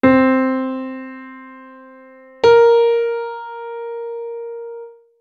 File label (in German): kleine septime